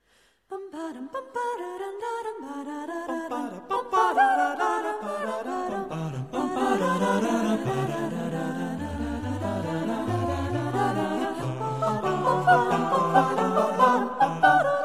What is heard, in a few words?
Gigue